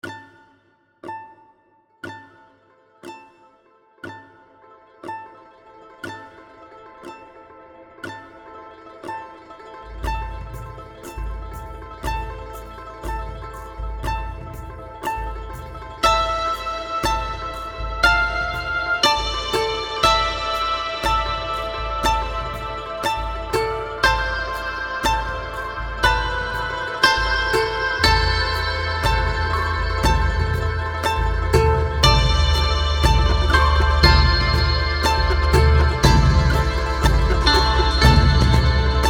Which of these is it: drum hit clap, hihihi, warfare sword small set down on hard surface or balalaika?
balalaika